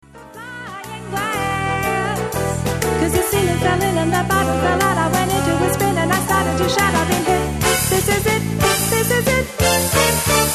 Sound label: Bassline